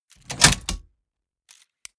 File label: mechanics small snap